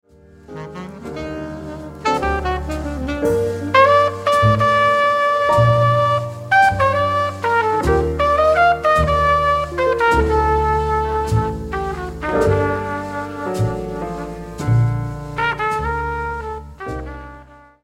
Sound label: solo